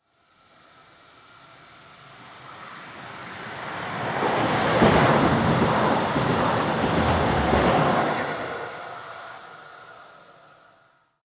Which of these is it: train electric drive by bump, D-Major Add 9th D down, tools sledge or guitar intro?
train electric drive by bump